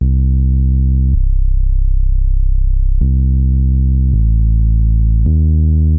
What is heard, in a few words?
SNTH BASS AMBNT 160 C; Ambient